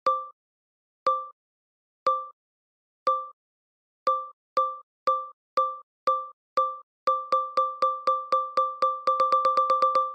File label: Camera Timer 10sec; Infinix; Hot 50 5G; ui